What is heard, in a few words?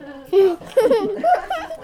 People Laughing Outdoors